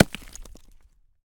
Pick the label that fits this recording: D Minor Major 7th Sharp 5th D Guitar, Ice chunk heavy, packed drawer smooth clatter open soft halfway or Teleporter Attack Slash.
Ice chunk heavy